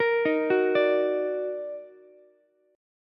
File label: D sharp Minor Major 7th, Ukulele; arpeggiated